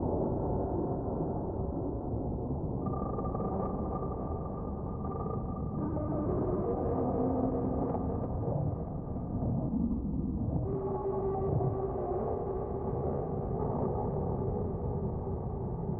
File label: Danger Tonal; Insanely Twisted Shadow Planet